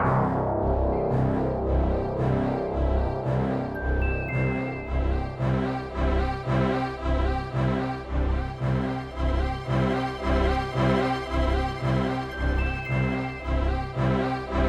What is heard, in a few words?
music strings; Bejeweled 3